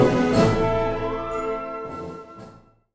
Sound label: Third Suite for Band Fanfare and Intrade